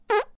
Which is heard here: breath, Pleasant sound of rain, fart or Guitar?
fart